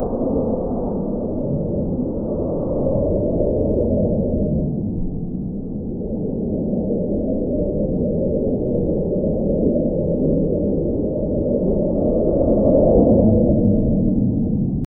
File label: underwater ambience; exploration